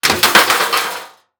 Impact Metal Drop And Roll On Concrete

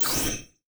Multimedia Internet CD-Rom Flash Whoosh Short

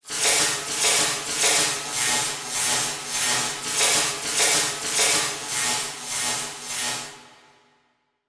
Title Transition Electrical Pulse; Production Element Title Transition

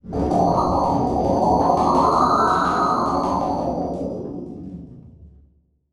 Title Transition Distortion

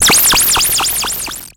Digital; Adobe; Multimedia Internet CD-Rom Flash

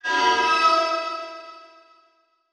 Transition Metallic Hit; Production Element; Title Transition; AdobeCC

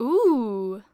Human Female Ooh Surprised Ooh

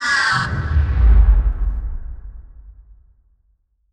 Title Transition Burst; Adobe; Production Element

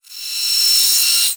Transition Feedback Distorted; Production Element

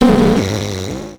Title Transition Communication Distortion Radio; Adobe; Production Element

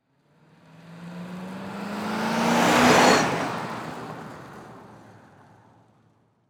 Auto Police Car Drive By; Transportation

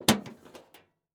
Sports Grill Metal Cover Opening

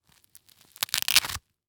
Foley Velcro Unfasten

